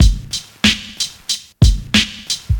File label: 93 bpm 90s hip hop breakbeat g key